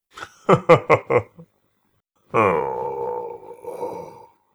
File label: epic fail; gesource; player